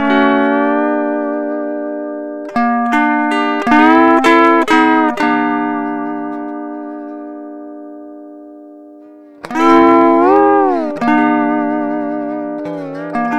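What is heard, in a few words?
Sunrise Guitar Chord D Dry, Lounge; Zero-G Kocktail Kollection; Construction Kitz (Lounge); Sunrise Mixes 082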